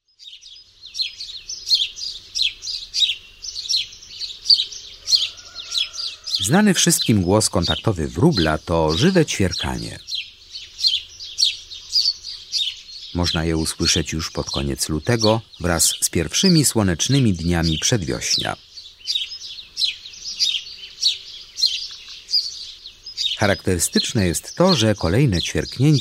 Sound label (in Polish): Wróbel, Ptaki; Kurs PTTK